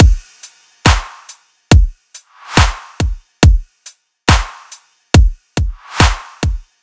Riddim Drum Loop 140 Full

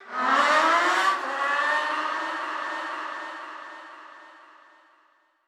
FX Moto; FX Collection; Hip Hop

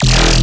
Bass Hit E, Dubstep

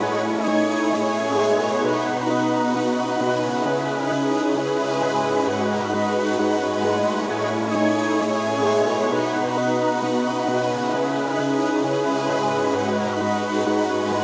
132 BPM C# Min, Melody, Reverse; Cymatics; 2022 Melody Collection

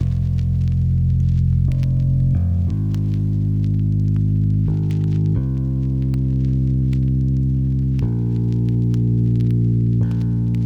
Classic Melody Loop 90 BPM C Min Bass; Melodics; Loops; Loop Stems; Cymatics; Eternity

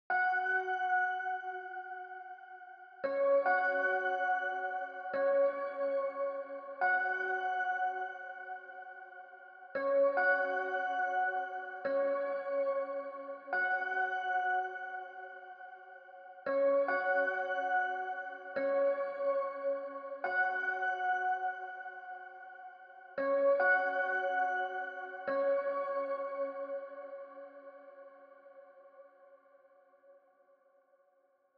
143 BPM F# Min Keys, Loop Stems; Melody; Cymatics; Cymatics - 2022 Melody USB Expansion; Vacant

